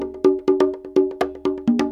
PERC LOOP ETHNO 125

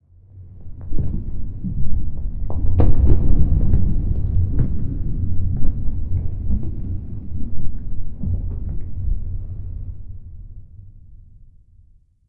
asteriods; Earth and Beyond; EA Games

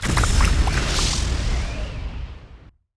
psi blow; Alpha Centauri

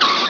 Collision; RoboWar 3.1

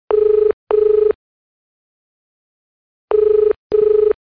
ring out; BigMax nº 7

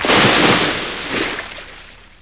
splash

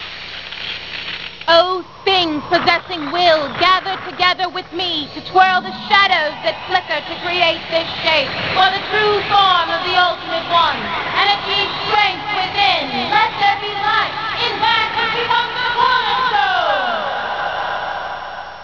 SPELL; urd; Plus! Themes

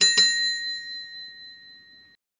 bell; SGI O2 Out of Box Experience 2.2; texaco

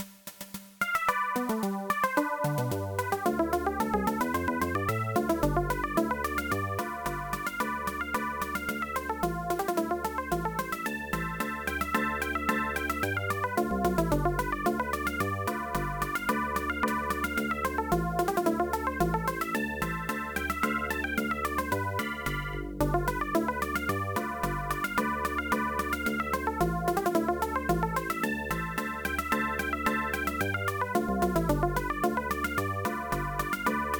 clarinet; adlib

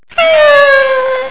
nyaon

